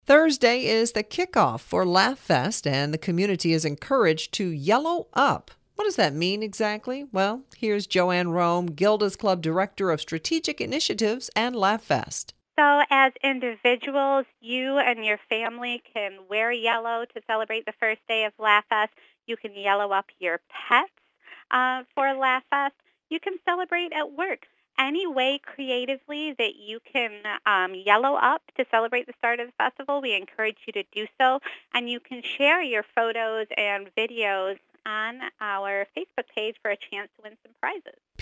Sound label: LAUGH